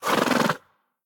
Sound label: mvt pet vocal; smite; lancelot